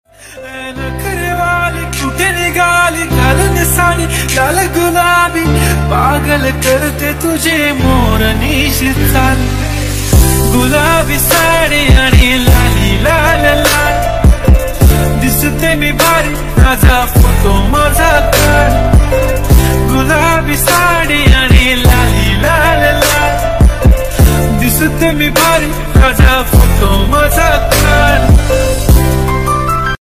ringtone marathi song

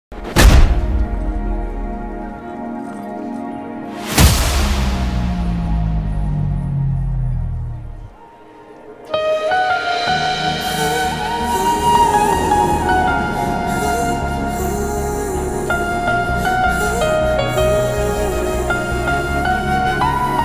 romantic scene background music; ruposh movie

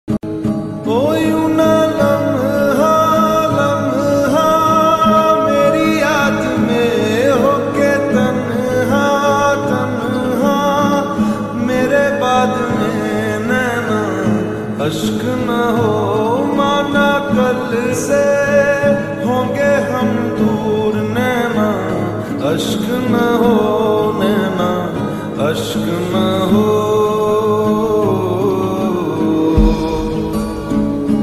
ringtone romantic ringtone love ringtone

